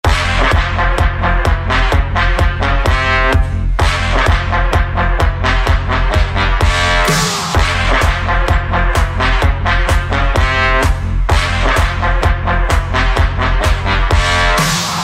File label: Techno Trombone